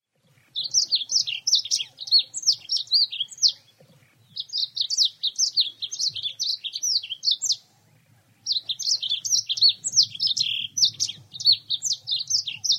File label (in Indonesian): suara serinus reichenowi